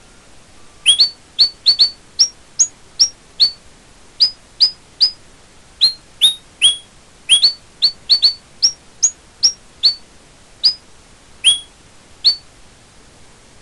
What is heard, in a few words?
SONG; digibirds